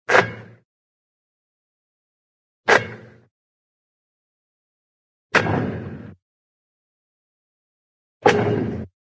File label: basketball, swish through net; cartoon